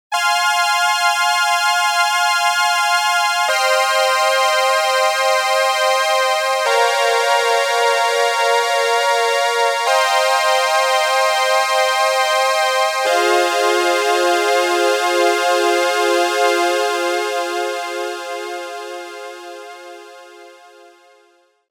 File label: classic strings; ob strings; obx